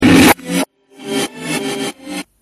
jingle; reverse

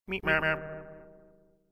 meep mop; cave

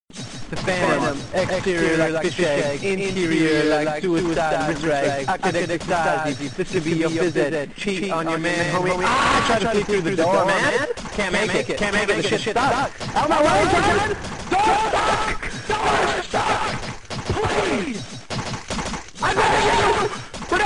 door stuck; cave

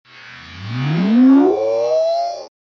ooofff; reverse

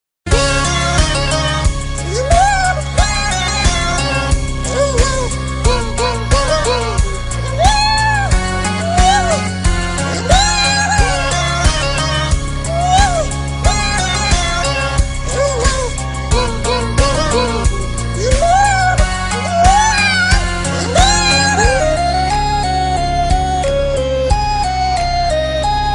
seal

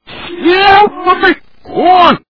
train brakes; reverse

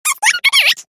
clock

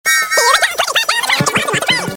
clickity clack

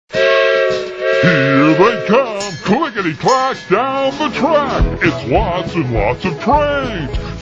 clickity clack; reversevape

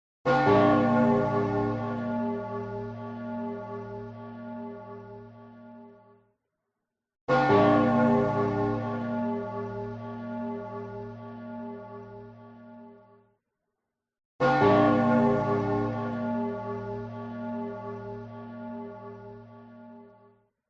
clocktower; reversevape

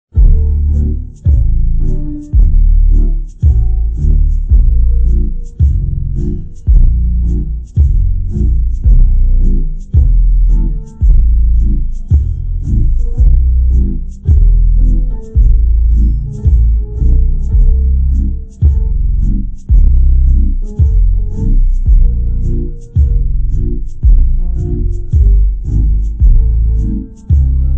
bass

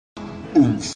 oomf

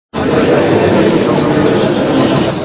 crowd; cave

